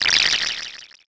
text anim

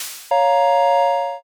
reveal

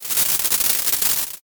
ovation

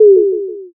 m message

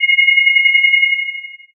forcefield

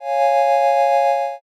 strings

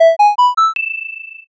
heal

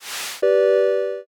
world move

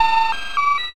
wyvern

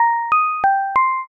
chime broadcast

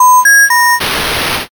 modem

